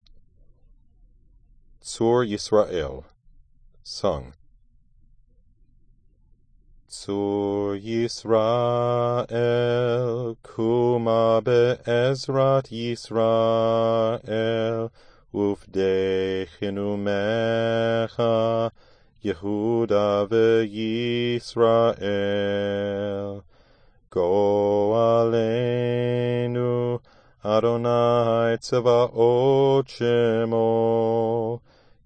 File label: tzur yisrael sung